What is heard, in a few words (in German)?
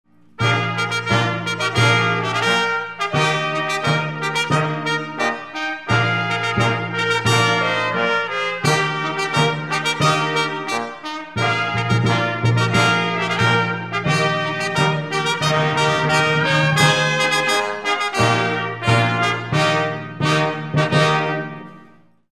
Polka Aufzug